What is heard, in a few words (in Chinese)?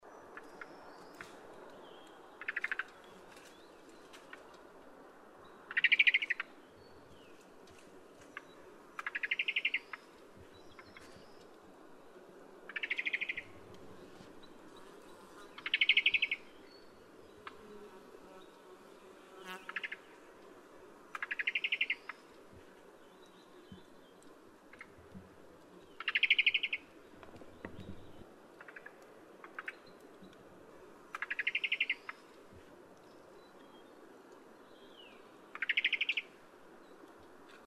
小翼鶇; 鶇科/留鳥; 藍短翅鶇